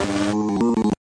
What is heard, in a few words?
slide glitch; Ze Franks Pain Pack